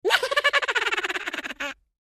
rire

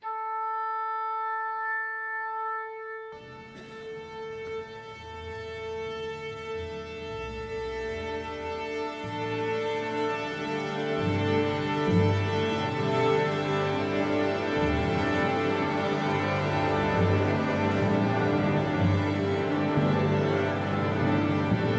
L accord de l orchestre

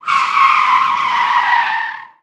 Crissement de pneus

